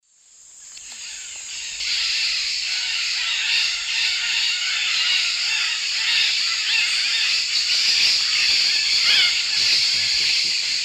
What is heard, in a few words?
Touit purpuratus, group calls and flight calls; S. America; Eastern Lowlands